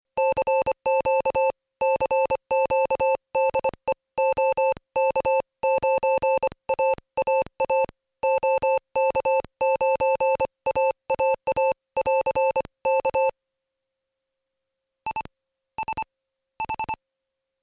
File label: Audio switch off, PicoKeyer